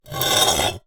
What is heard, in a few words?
Drag, Vase